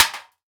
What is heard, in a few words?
Weapon Mace Hit Metal; AdobeCC